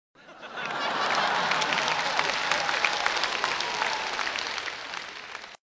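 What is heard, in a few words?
Laughter and applause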